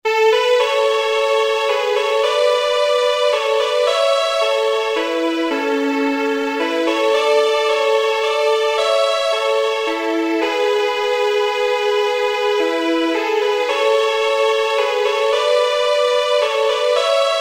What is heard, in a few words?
Waltz